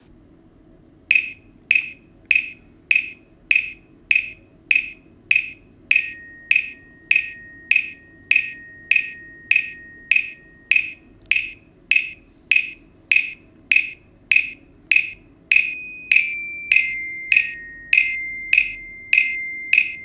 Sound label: gallant march bells